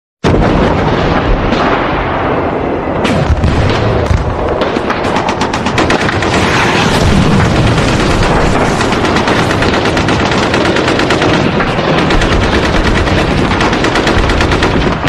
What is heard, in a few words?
gun shots